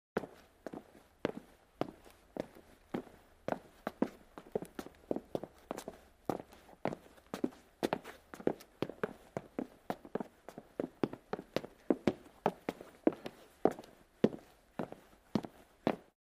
Footsteps On Wood

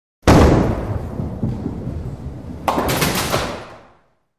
Bowling Ball Rolls

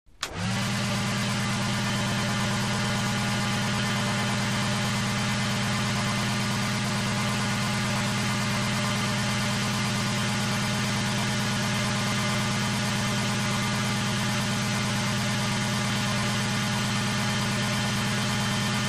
Evacuation Motor, variable speed

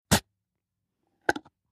Jam Jar Unscrew Lid, kitchens

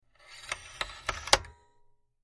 Antenna Telescoping

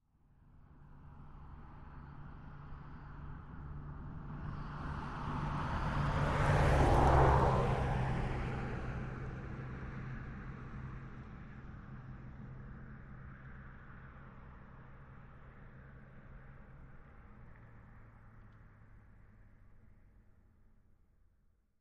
Truck V10 Motor Smoot, dodge ram v 10

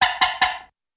Single Car Horn Honk

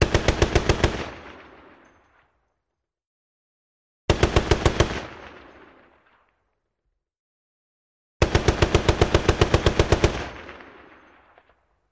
.50 Caliber: Medium-long Bursts

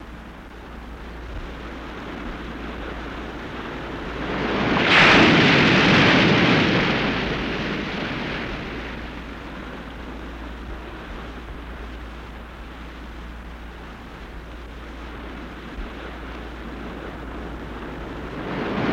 Wave Crashes Med Size

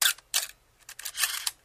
Single Camera Clicks

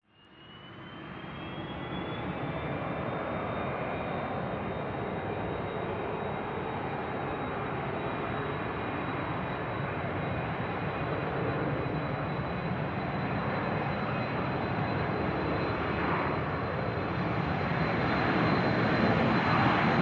Jet By Large Commerci

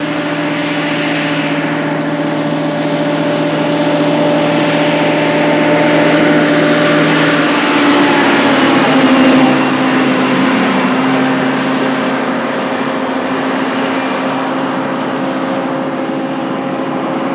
Truck Diesel By

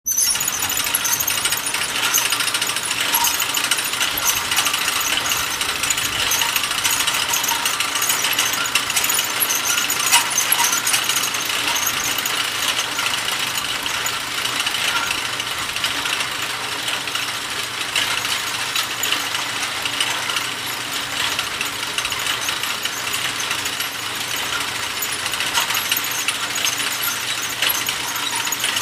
Interior Garage Heav, gate, motorized; Interior Garage, Heavy Door Roll Open With Heavy Motor Squeaks And Screech.

